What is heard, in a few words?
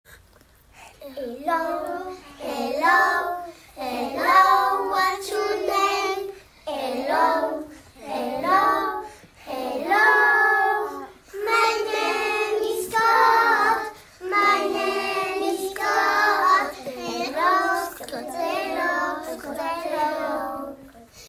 hello song; classe 1